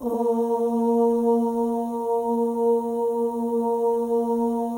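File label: OH, female OHS, Voices; Symphony of Voices; Spectrasonics; FEM.1 OHS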